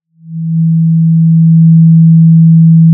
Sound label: Sound of a cruise ship horn